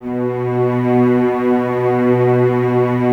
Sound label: cellos B 2, String Ensembles; Miroslav Vitous; 90 s Sample CDs